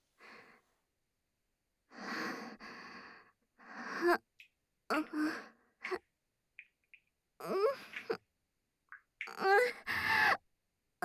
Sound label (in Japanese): 喘ぎ（SEあり）